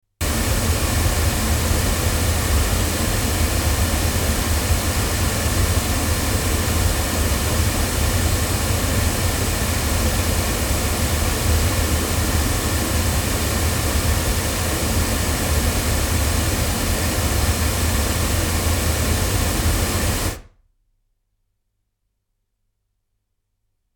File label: loudspeakers, microphones